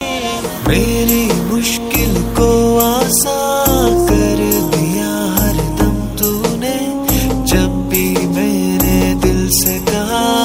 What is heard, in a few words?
islamic ringtone